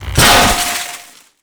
mohole pin snap

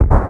explosion far start; World of Tanks Blitz

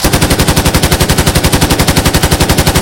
Automatic PKT, Weapons; DCS

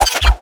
whizzby

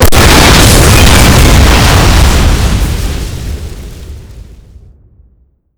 aagun destroy, Explosions; bog; Call of Duty Modern Warfare

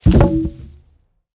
pole falling; World of Tanks Blitz

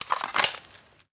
ammo box insert; Rust

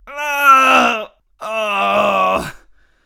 agony; Escape from Tarkov; usec2

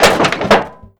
mtl sheet wronk; Call of Duty Modern Warfare

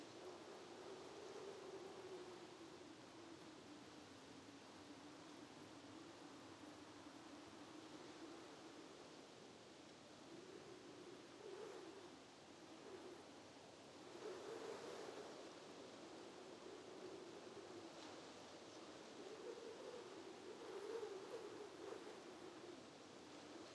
ambience arctic beach calm; Rust

